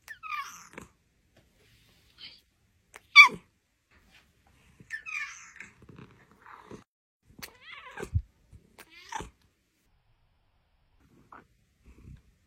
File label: meow; luna